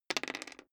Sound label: dice roll table